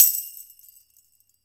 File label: DEFI OPEN, Mediteranean Traditions percs